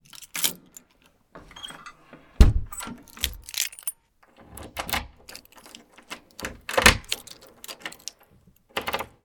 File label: Latch Door Close Metal Wood Keys Handle Rattle